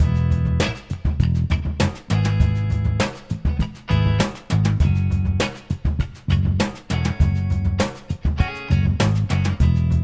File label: solo loop